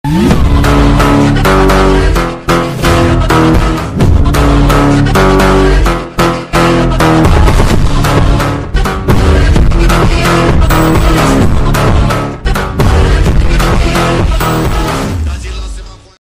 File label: ringtone